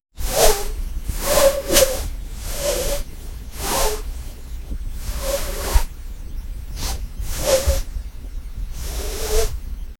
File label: soft stylized rope scrapi; fantasy